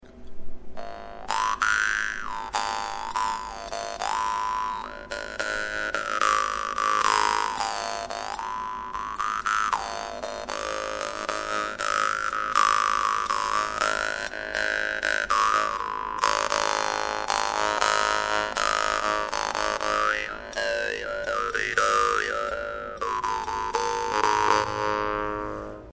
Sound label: vargan; dan moi fish double